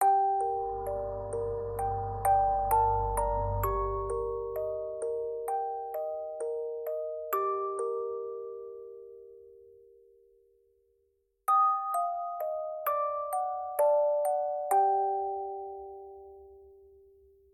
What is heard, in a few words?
Intro Alt Music; The Legend of Bum Bo